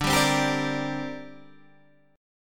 D 7th Suspended 4th Sharp 5th down Guitar Half Step Down; D7sus4#5